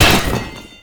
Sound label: Umbrella Stand Destroy; Warframe; Ambience; Nineteen Ninety Nine